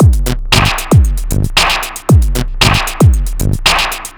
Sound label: Funky at 115, Drums Beats